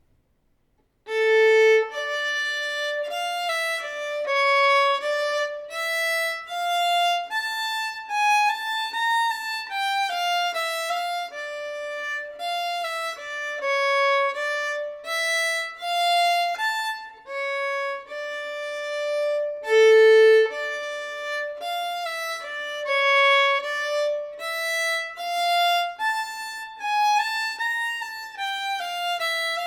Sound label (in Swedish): Vals langsam